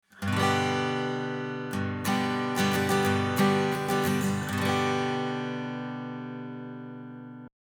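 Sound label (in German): Tascam Model24 A Git Schoeps Mk4 EQ Bass -15dB, akustische Gitarre; Gain 3 Uhr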